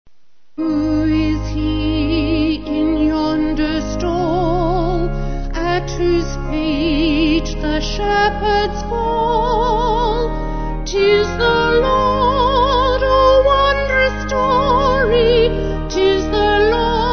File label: Vocals Organ